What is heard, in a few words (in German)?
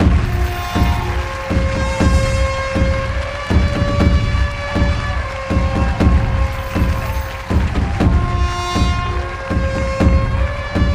8 Takte Loop; Gladiator